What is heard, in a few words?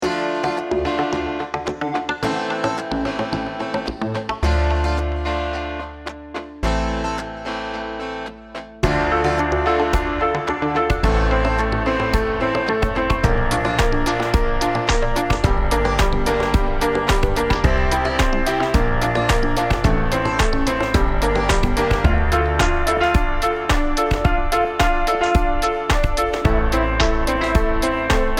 Piano Player